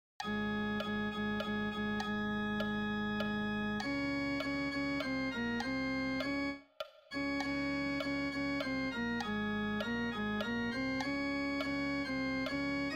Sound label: Tenor; Peuple de baptisés